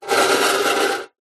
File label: metal box small empty slide scrape concrete; zapsplat; foley